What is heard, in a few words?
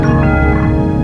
Begin Transmission; trek